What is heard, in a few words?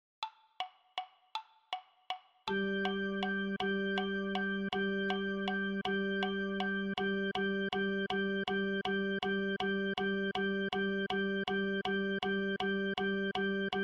Rhythm Practice G note